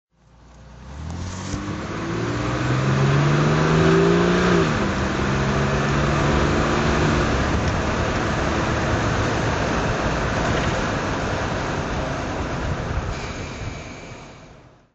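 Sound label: jag xk150 automatic drive, Engine sound driving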